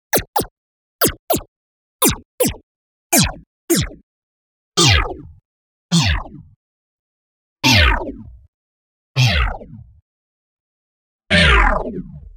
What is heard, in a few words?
Splashy Lazer Becomes Growl